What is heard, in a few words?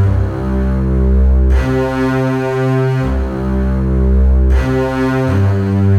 Contrabasses